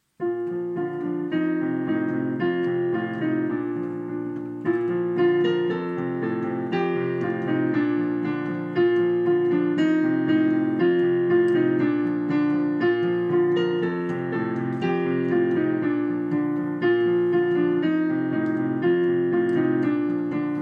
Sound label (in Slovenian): Šmentana muha karaoke